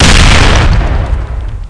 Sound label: bomb; fish; tower client